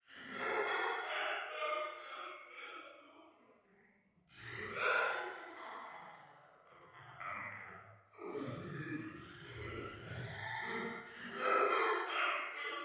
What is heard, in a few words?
ambiance giant chase; Burger and Frights